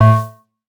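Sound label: Objective Failed,video game rip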